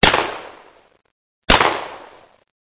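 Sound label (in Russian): два глухих выстрела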